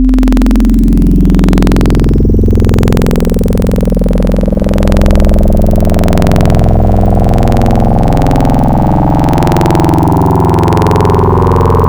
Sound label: Sine Bend II, Wavetables; Cymatics; Wavetables Collection Vol 3; Serum